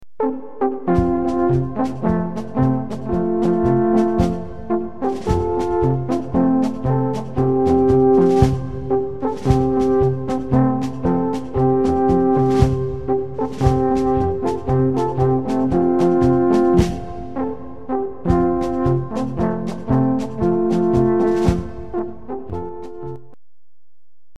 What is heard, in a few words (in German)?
Polka, Alphorn